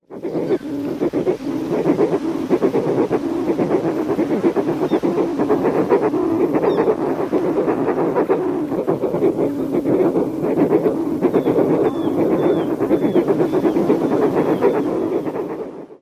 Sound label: colony calls